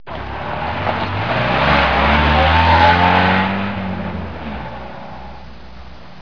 trucks

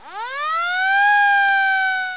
emergency

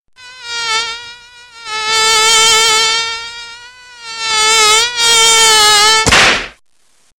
funny mosquito

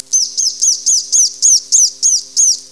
bird hawk

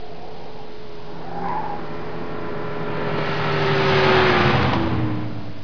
cars

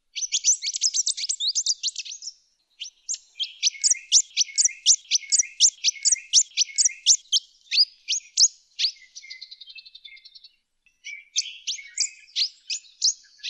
pintail whydah

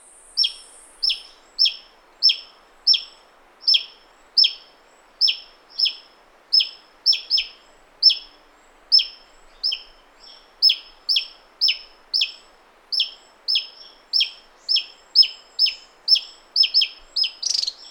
tesia jawa call

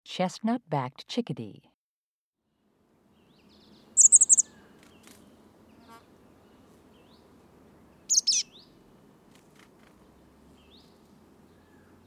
chestnut backed chickadee

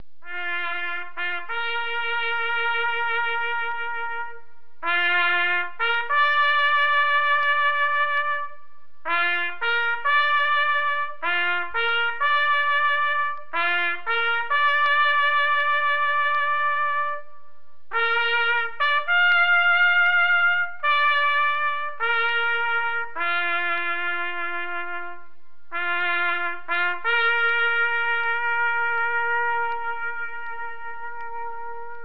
musical

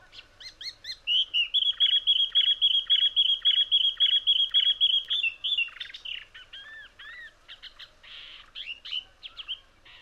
spiny babler

